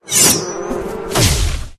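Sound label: wasp attack bottom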